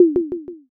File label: tsuzumi